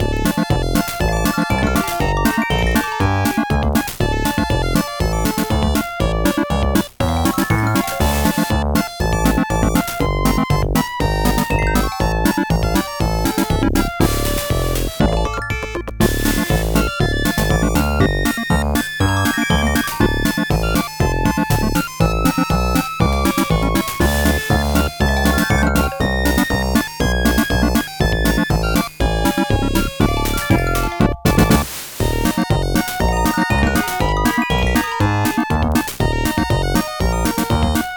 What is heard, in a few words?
silly FM groove